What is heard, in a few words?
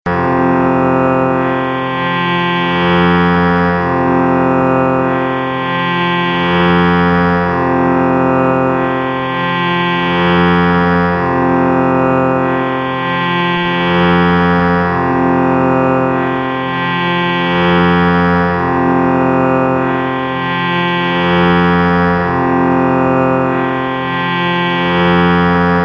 Sruthi F